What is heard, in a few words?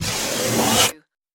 Dry Wall Slide Off; Sound Effects Bible